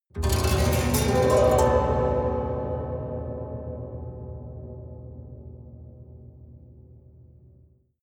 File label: haunted strings up stroke fast hard